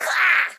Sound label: Imp Attack; Folk Hero